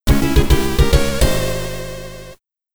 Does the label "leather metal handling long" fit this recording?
No